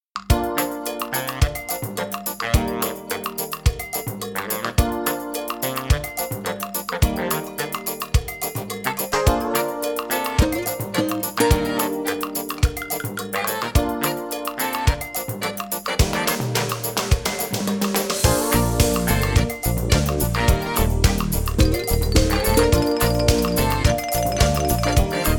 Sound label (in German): Saxophone Duell